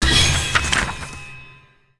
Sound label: ui academy paper book open